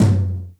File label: Toms, Real